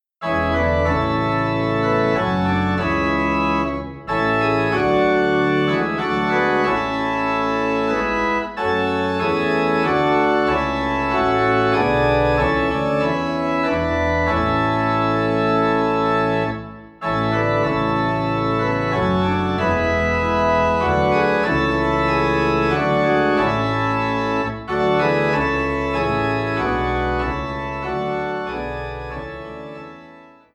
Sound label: The First Noel - Organ Low in A; christmas